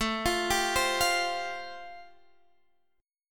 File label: A Minor 7th Sharp 5th A, Guitar, Half Step Down